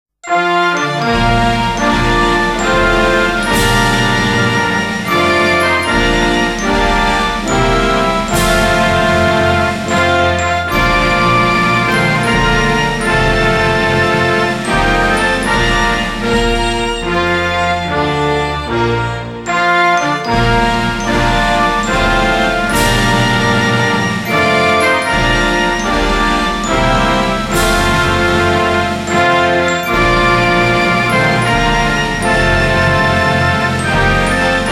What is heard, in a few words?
The Star Spangled Banner Instrumental